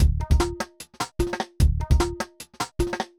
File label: bangra 150